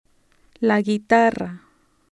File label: guitar